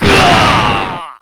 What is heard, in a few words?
shovel pain strong